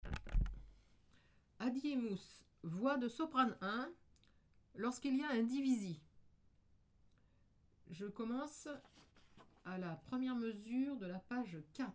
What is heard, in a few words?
soprano